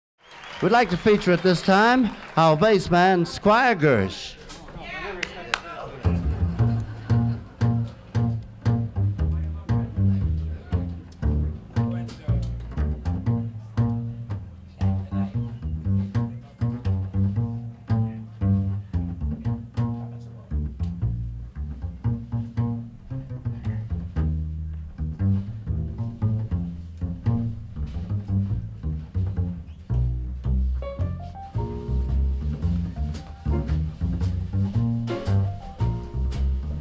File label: Riff Blues, Jazz; Jazz For Dummies - A Companion To The Book